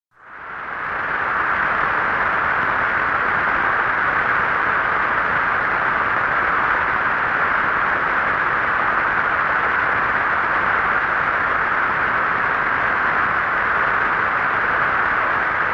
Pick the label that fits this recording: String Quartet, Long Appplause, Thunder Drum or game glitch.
Long Appplause